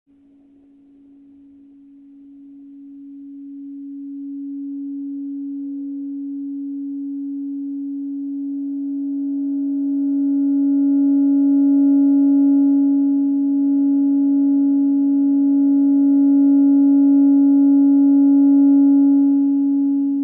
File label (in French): grave, aeolian; corde grave - dyneema 3 mm - bass string; enregistrement live - live record; Expérimentations diverses et en cours. Current various experiments